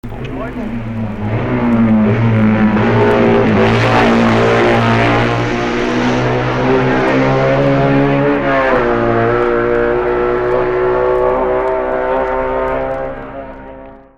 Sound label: BMW Twin Outfit; 1961 BMW Outfit